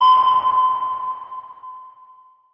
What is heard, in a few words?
sonar